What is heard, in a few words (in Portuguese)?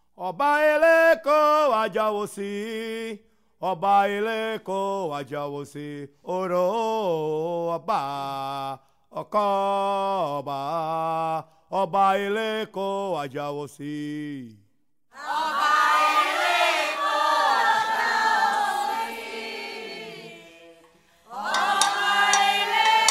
toada para oba